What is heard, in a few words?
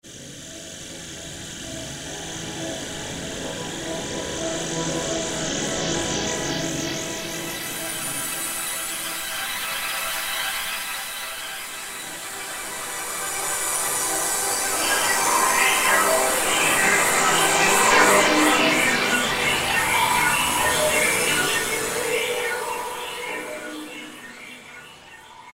PAD; DJ - Producer Tool Kit